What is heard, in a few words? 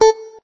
note beepey